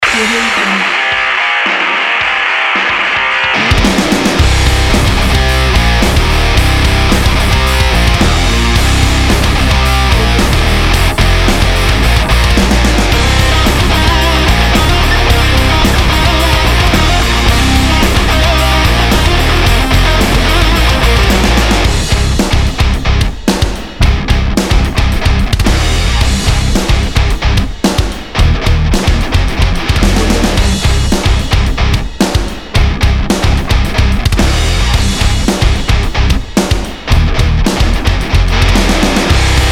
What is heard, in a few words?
heavy metal rock driving; musicfactor